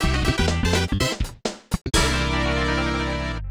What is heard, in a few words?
Star Catch Fanfare; Nintendo 64; Super Mario